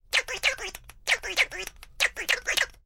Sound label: Horn Honk Funny